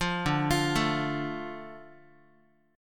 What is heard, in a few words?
D Minor D Guitar; Dm arpeggiated; 8,10,8,7,x,x; JGuitar